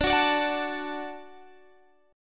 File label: D-Major, D, Guitar, Standard; D strummed; JGuitar